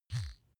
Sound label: razor smooth single